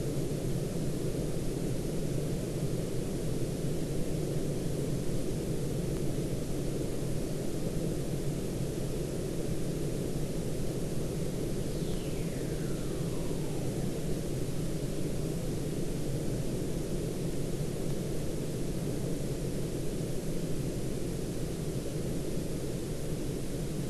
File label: WFR audio emfisis